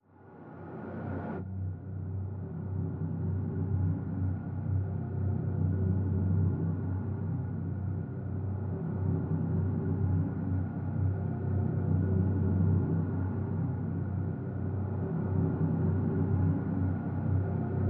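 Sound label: drone simple F; Drn Bleeding Out Simple F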